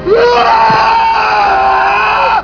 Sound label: scream